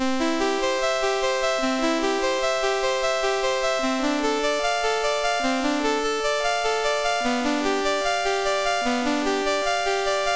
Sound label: Bachs Prelude in C BASIC, MOS6581; MOS6581R2